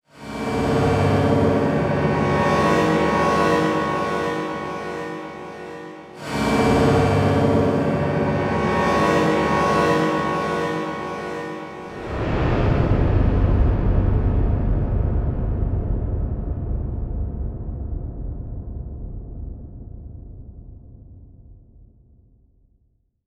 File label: Electronic Whoosh Reverse Feedback Digital